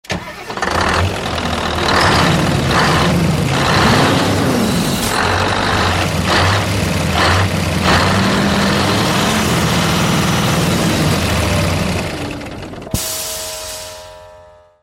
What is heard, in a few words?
scania v8 ringtone